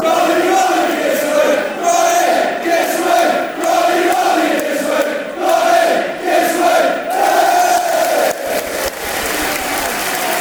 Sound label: give us wave, chants; ronnie